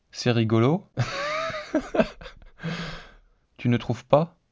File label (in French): rire synthese basique